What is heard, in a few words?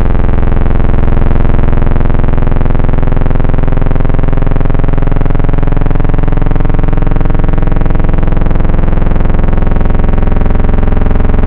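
Sweepy Bass, Synthwave wavetable; Synthetic's Serum Collection; BVKER - Synthwave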